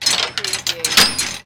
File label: Chain Metal Clanging